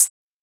hat; Anthemic

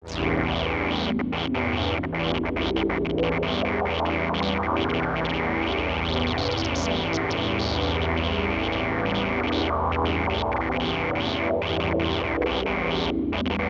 SPACE PAD 140 A; SpaceSounds

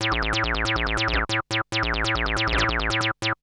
SPACE SYNTH 140 A; SpaceSounds

